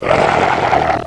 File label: pit drone die; svencoop